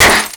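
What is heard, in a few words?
bullet impact paint metal; Modern Warfare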